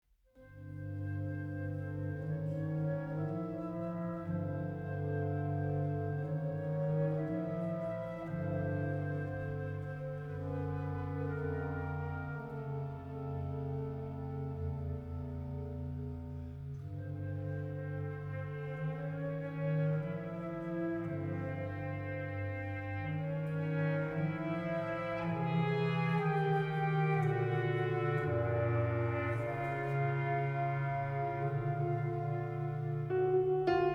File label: suspense